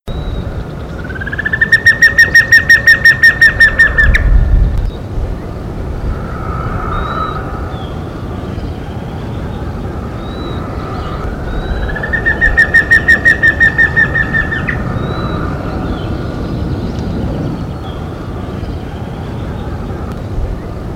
Batara cinerea; PE Cantareira; SP; Brazil

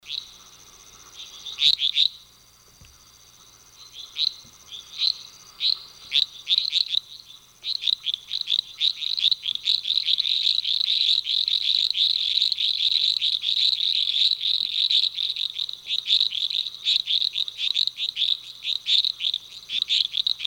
Dendropsophorus elegans; Picinguaba; SP

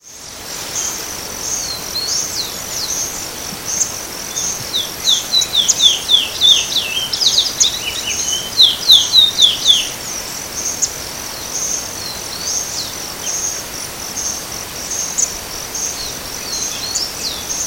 Sporophila angolensis; PN Itatiaia; Silveira

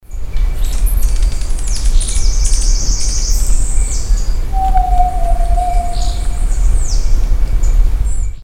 Crypturellus noctivagus; R Guarau; EE Jureia; SP; Silveira

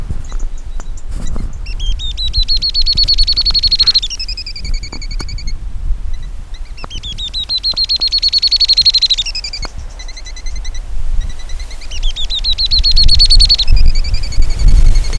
Schizoaeca Asthenes moreirae; PN Itatiaia; Silveira

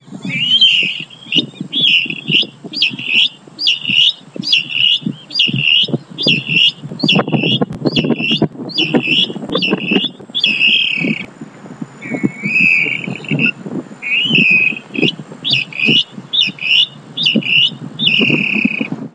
Pardirallus sanguinolentus; Silveira

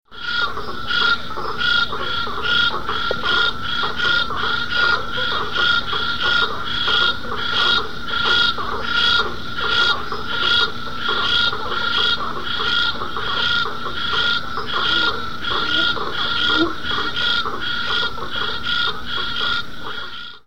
Scinax crospedospilus; Itap da Serra SP; BR Silveira

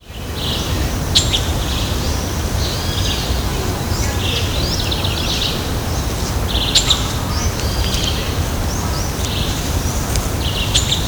Myiodynastes maculatus; Ibirapuera; SP; SIlveira

